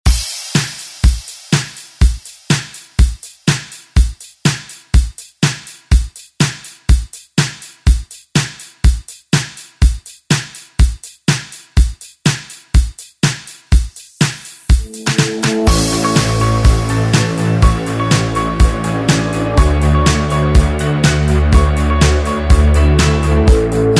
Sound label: ReDrum; DJ Mhark